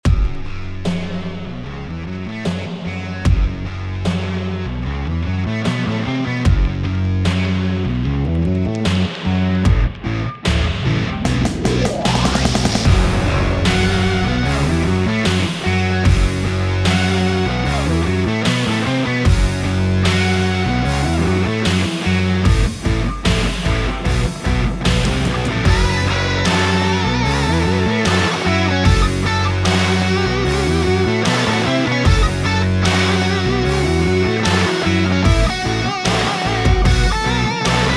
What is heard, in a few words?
Sport Action Automotive Rock Track